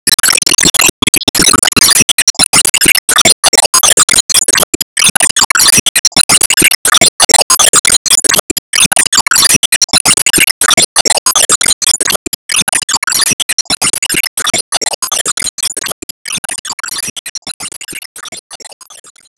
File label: Enemy Bigbumpo Active Loop; Enemy Boss Common Active Charge Loop